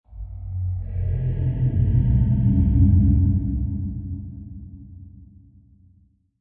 Horror Ghost Murmur, Scary, eerie, distant and deep ghostly murmur; Like a spirit being passing through the room, unnoticed; Ghost Sound Effects